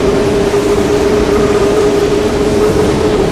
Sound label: Tornado Loop; Destruction